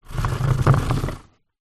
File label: household chair patio plastic drag wooden decking